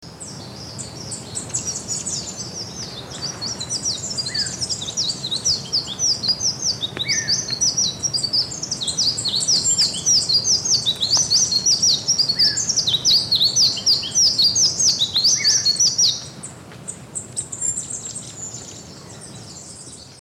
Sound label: spectacled whitestart